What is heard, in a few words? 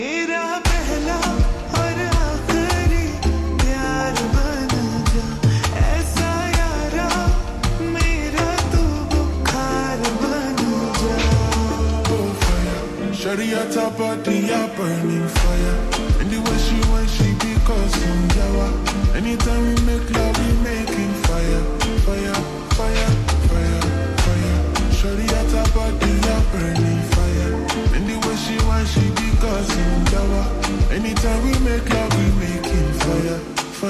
Ringtone Slowed Reverb